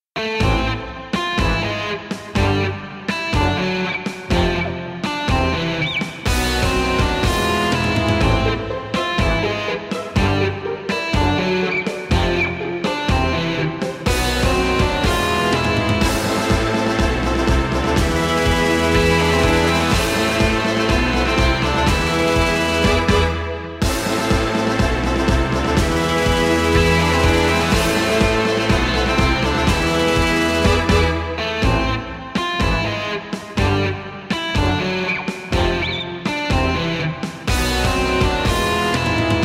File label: game over